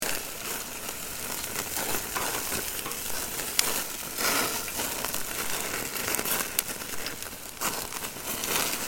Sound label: Meat cooking sizzling hamburger loop